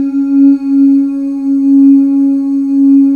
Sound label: BGV OO